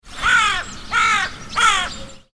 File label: blackcrow